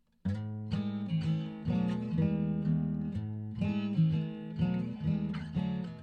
acoustic guitar 50 cents down

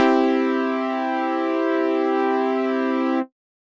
Low Pass Saws

